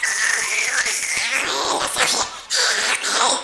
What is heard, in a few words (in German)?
golum sounds, Monster; grrrroooaaar-monstergeraeusche-auf-der-play19; Hamburger Markthalle